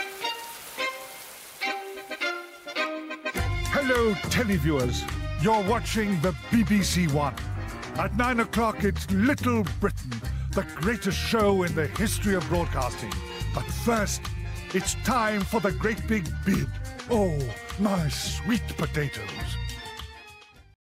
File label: Little Tango; identica; uk